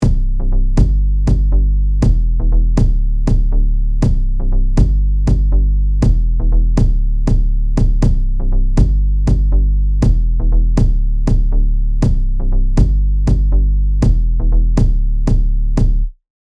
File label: kick bass; waves